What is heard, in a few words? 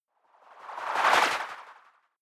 whoosh simple flutter futuristic